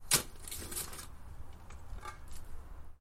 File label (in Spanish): cavar